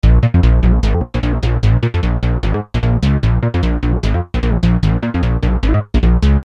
Syn-Bass m A#, Techno Synth Loops, 150bpm; Sonic Foundry (Sony Creative Software); Signals I

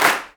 CLAPS, Africa Handclaps

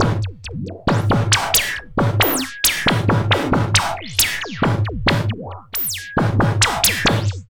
LOOP, Tekno Industrial; East West

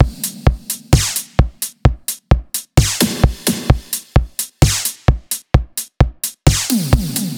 Beat Stealth; Zero-G; Future Wave

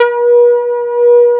ESQ 1 MOOG, Synthesizers; Soli-Music; World of Synthesizers VOL-1

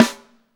SD, KIT Real-Kit; 90 s Sample CDs; Sound & Vision - Gigapack I CD 1 (Roland)

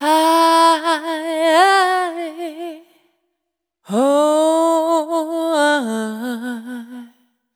Single Voice Melodic Loops

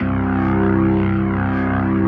C1S, B3

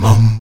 VOX MUM A 2A, Afro Chants, Stacc Mums; Roland LCDP11 Africa VOL-1

